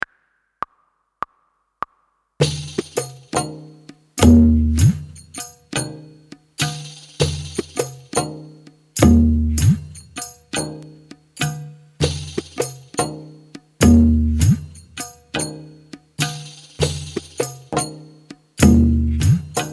Ga Slide Practice with Tabla

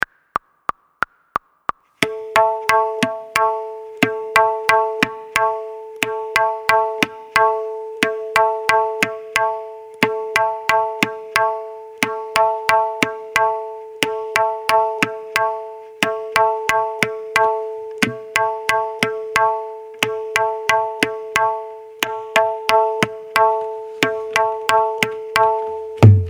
Khemta tal practice

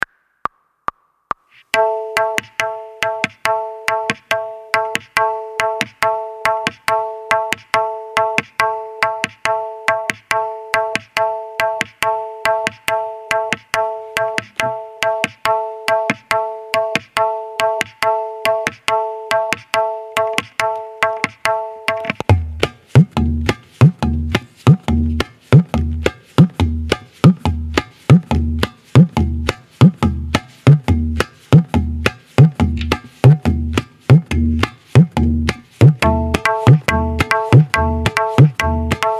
Doogun Practice

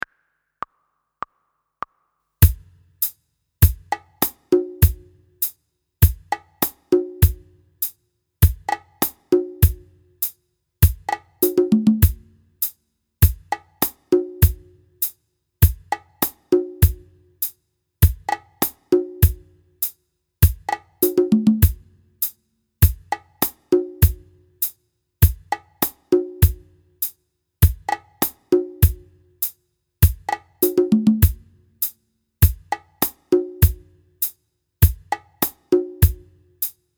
slide practice without tabla

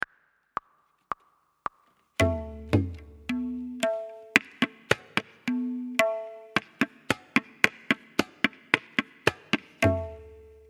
Tabla Solo

